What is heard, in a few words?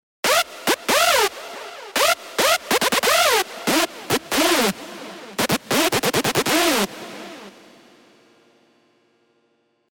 Screech; Half Dose; revealed serum 2 rave asylum